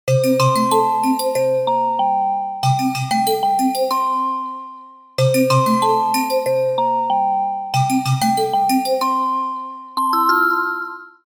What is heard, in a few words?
Bottle